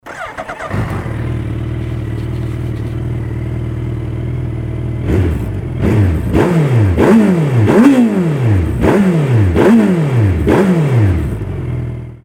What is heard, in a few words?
hayabusa custom